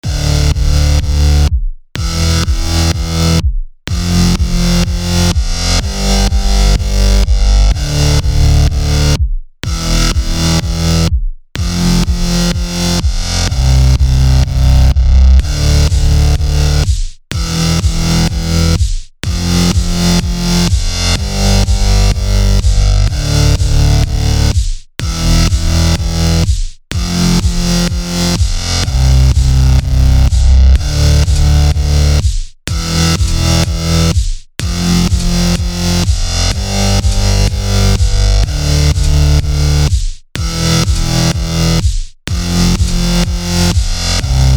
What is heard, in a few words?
electro test; weeklybeats 2012